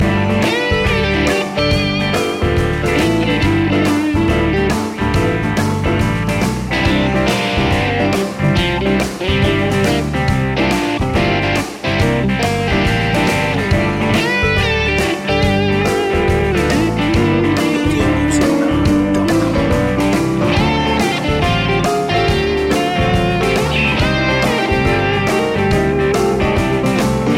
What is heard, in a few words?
feelgood blues loop